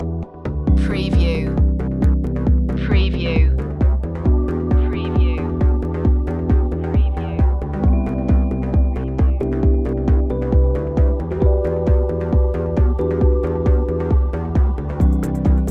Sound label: ground pulsation loop